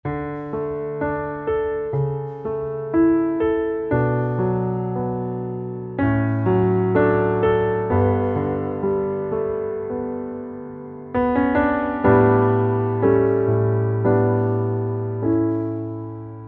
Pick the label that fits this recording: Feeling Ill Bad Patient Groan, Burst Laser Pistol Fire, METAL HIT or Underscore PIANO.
Underscore PIANO